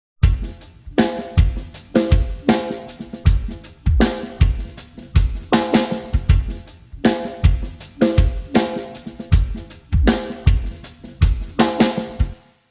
drums 133; timestretch; prosoniq time factory dirac

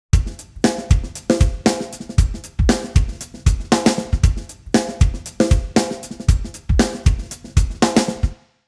drums, time stretch; digidesign pro tools xform

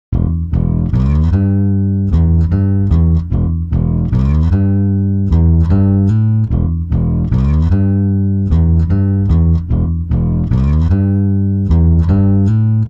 bass 133; timestretch; serato pitch n time le

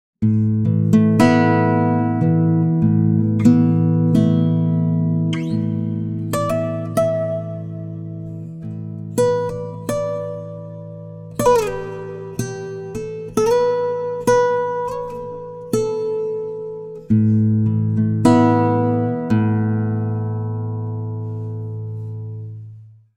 guitar, time stretch; izotope radius

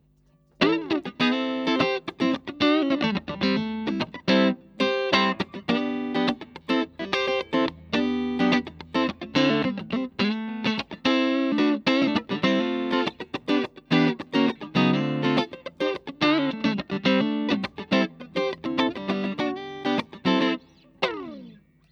stratocaster; fender new standard guitars

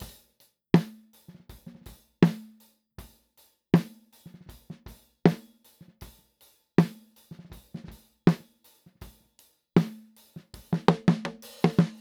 snare slingerland test snare, drums; crowley and tripp el diablo

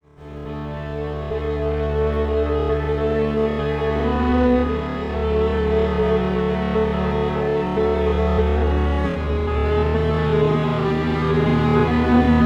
strings, time stretch; digidesign pro tools ts

